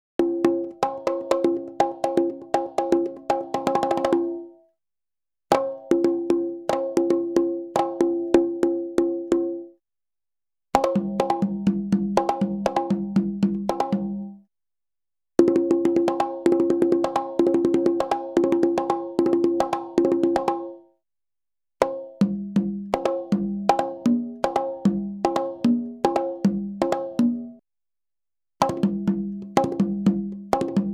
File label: Salsa Picante; Masterbits; World Zone VOL-3